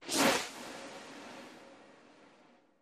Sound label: Baseball Arm Whoosh; baseball fx & games